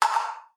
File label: Plastic Cup Dropping